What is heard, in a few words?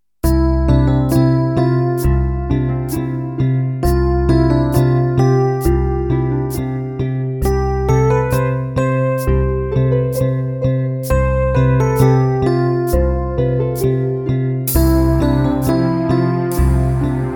a few notes